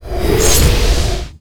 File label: Guillotine; Pc Skill; FlyForInsanity